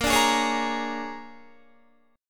Bb 7th Sharp 9th Bb, Guitar; Bb7#9; strummed; JGuitar

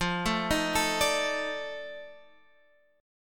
F 13th, Guitar, Half Step Down

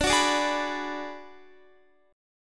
D 6th Flat 5th, Guitar; D6b5 strummed; JGuitar

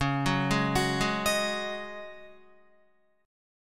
Db Suspended 2nd Suspended 4th, Guitar; arpeggiated

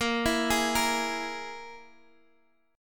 G sharp Suspended 2nd Sharp 5th, guitar chord; x,x,8,9,9,6

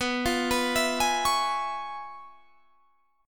Db 7th Sharp 9th B, chord shape

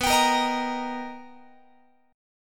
B Augmented Major 7th B Guitar, chord; B+M7; strummed

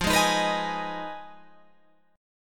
F Minor 7th Flat 5th, Guitar, Open F

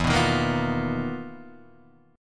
B Major 7th Flat 5th E, chord shape; BM7b5/E; strummed; JGuitar

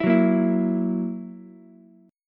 F sharp 7th, Ukulele; strummed; JGuitar

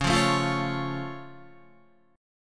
Db Augmented, down, Guitar; strummed; JGuitar

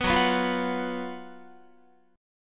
Gb Major 7th Suspended 4th Gb Guitar

